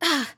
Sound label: damaged, RPG Voice; RPG Voice Starter Pack